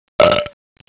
BELCH

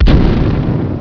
BOMB EXPL

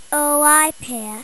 SIGH

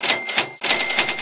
cash

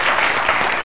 big bonus

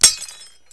GLASS

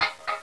aluminum

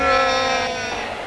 HOORAY

